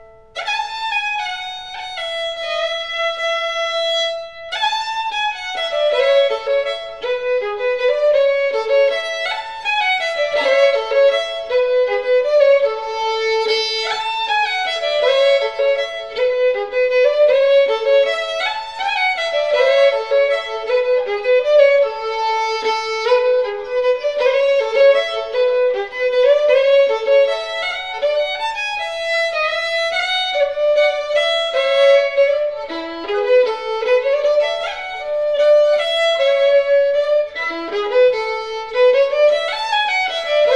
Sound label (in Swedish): Kom lilla flicka vals Sarna